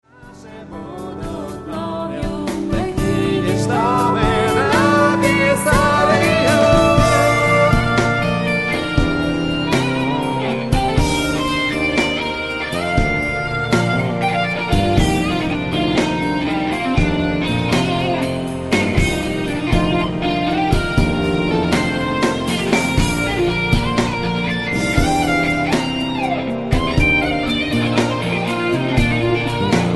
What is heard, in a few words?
E Guitar Solo